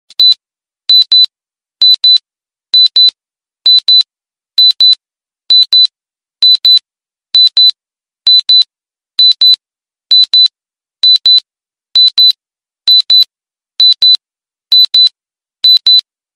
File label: Beep Beep